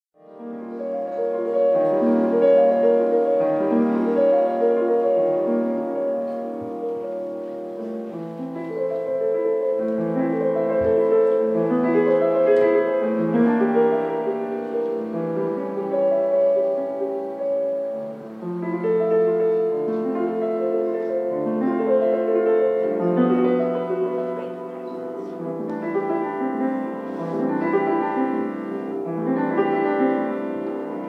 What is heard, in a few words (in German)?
Klavier; Maerchenvideo